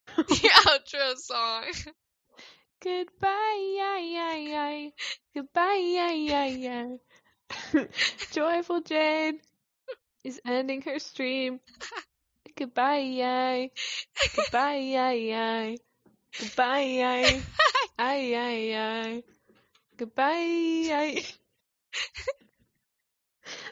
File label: ending song